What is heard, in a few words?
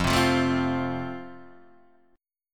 F Major Guitar Half Step Down; chord